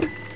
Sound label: Linefeed; F/A-18 Hornet 3.0